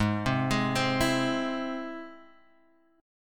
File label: E Augmented G sharp, guitar